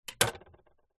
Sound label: household kitchen knife set down on wood table